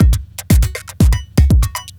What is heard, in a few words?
Tech Beat 120, techno 120bpm; musicradar; Beats